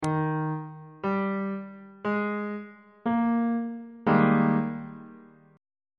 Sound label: a flat major 7th suspended 2nd 2nd inversion on bass clef